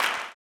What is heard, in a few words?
CLAP, Blues Soul; Spectrasonics Vocal Planet